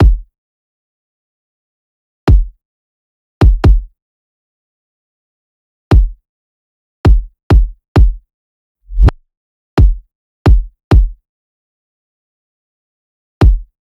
loop kick 132; Seismic Loops

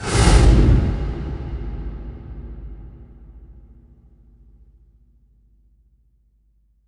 impact flame, Cinematic Impact

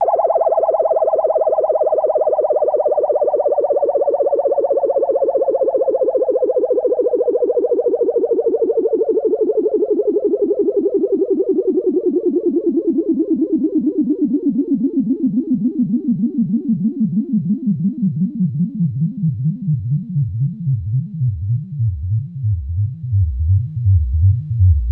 FX, Drum & Bass

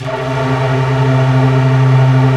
Tunnel C2; Digital Sound Factory Xtreme Lead

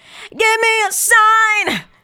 GIMME A SIGN, FEMALE VOICE; Best Service; Hallelujah

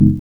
ORGAN A-L

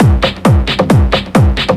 DRM 135, House, LOOPS 135; Best Service ProSamples vol.10 - House; AKAI

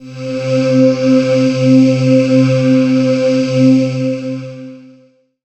pad, Dance Synths Break Pads

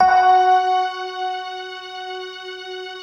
Fender Violin, Fender Stack; Optical Media International; Sonic Images Library

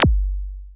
FX Analog; Sound & Vision - Gigapack II

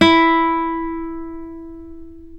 Classical Guitar, Nylon String; Roland LCDP02 Guitar and Bass

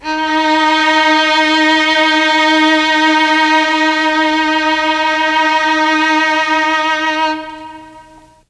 D#2 VLNS, Mellotron; Mike Pinder - Mellotron Archive CD

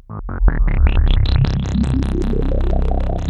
Korg, FX

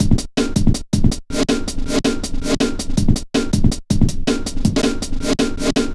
metallic stepz, drum loops

